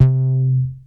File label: Bass Synths hits and octaves